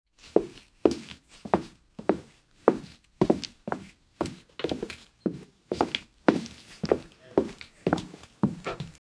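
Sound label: sound footsteps on old wood, interior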